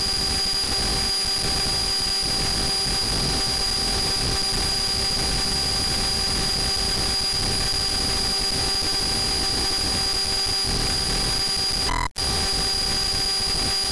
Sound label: fan